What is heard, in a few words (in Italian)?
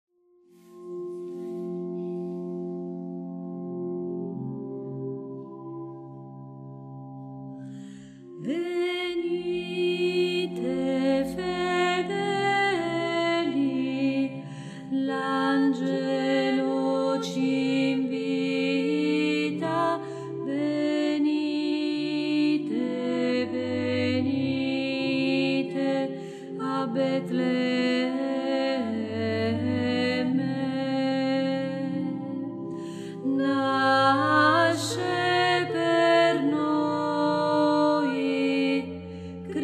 contralti